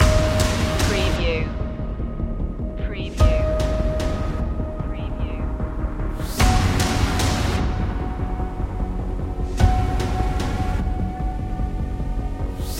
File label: dark power loop